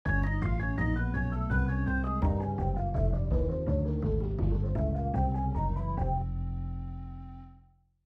BLUES 12

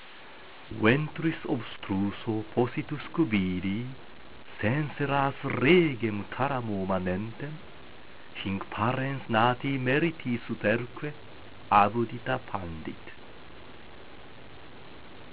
Utqueant, latin

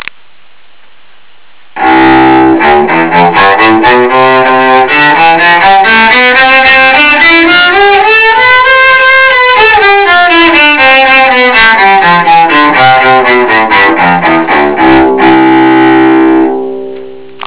C scale, cello

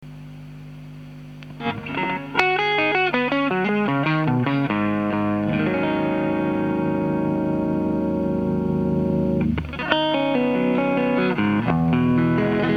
BOSS CS-3 Compression Sustainer, CLEAN DRIVE; LEVEL8 TONE5; ATTACK5〜6 SUSTAIN6; SD-1 DRIVE3 TONE6 LEV7; ワリとノイジー。

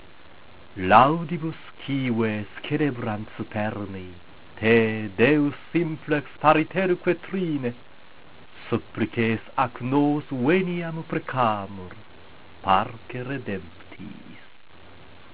Utqueant, latin

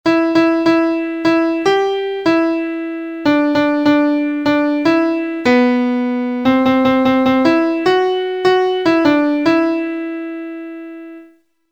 melody

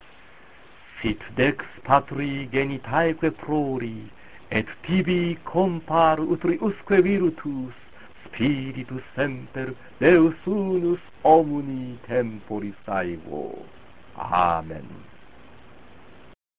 Utqueant, latin

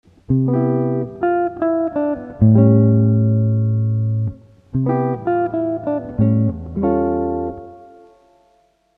S Guitar

